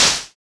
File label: FX, Roland; Sound & Vision - Gigapack II